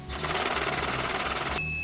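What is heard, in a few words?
transfer money; india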